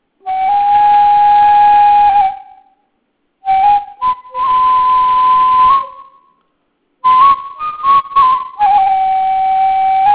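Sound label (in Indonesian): suLing sunda; ringtone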